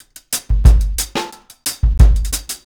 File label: RG90 DRUM, REGGAE